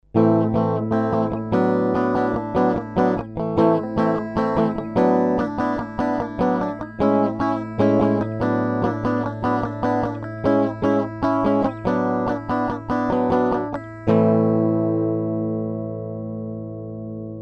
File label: Auria clean